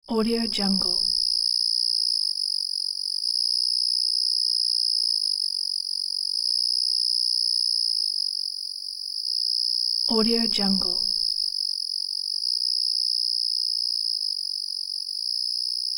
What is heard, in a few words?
evening countryside ambience with steady insects; sound ideas